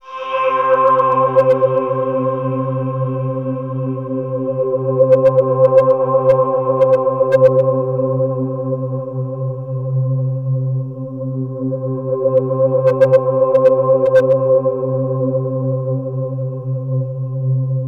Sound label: Access Virus B; Synthworx